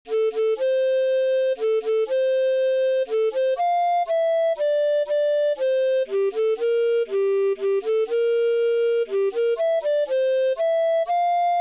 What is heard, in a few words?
Lullaby, recorder